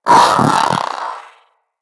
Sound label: Companion Vocal Bark Double, Pets; Zanuka